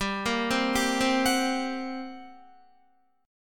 G Minor Major 7th Double Flat 5th, G, Guitar; arpeggiated; JGuitar

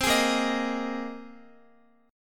A sharp 7th Suspended 2nd Suspended 4th, Guitar; A#7sus2sus4; strummed; x,x,12,13,10,11

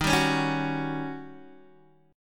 D sharp 7th Sharp 9th Guitar DADGAD

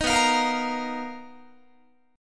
B Major 7th Flat 5th B down Guitar; strummed

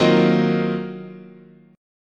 D sharp Minor 6th Add 9th, chord

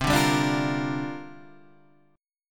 C 11th Suspended 2nd C; C112 strummed

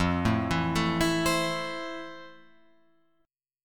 F Major 7th, Guitar, Open F; arpeggiated; JGuitar

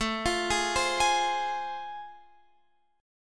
A Minor Major 7th Sharp 5th, Guitar Open F; arpeggiated; AmM7#5; JGuitar

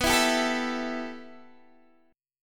B 7th Suspended 4th Sharp 5th, Guitar, DADGAD

